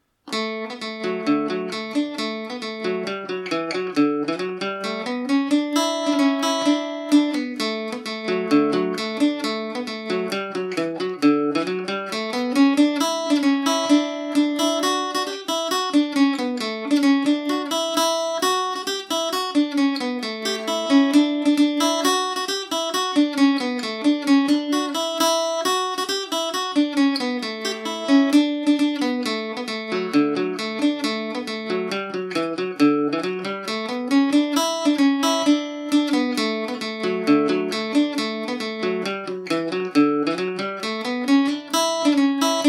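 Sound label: D major, irish mandolin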